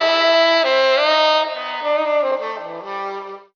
saxy beech, Stabs

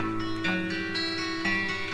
guitar, Jazzmatazz loops

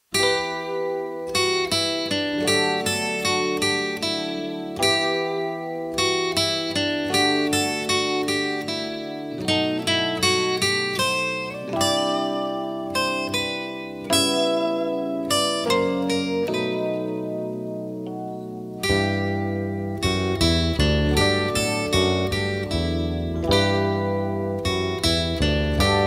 asian sadness

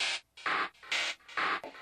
electro thingy; Drum loops

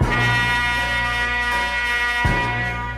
horns finale

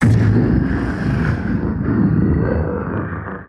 fake explosion; Crash Bang Boom

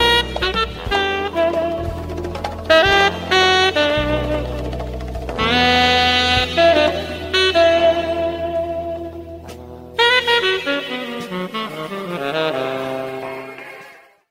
sax no rhythm to it, Loops; Phonky

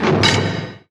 door close jail

